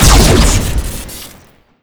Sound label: booster crit, weapons